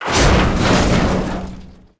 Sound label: junk crash metal; impacts explos